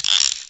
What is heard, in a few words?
creak